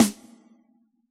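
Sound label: rock kit snare; Giga Samples Collection; Dr 202 Kit 1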